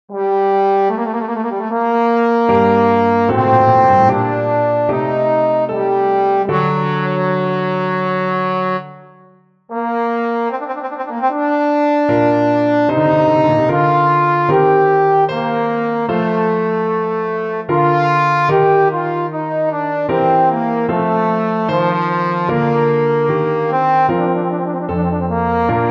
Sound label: sonata, trombone